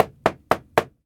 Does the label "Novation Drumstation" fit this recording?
No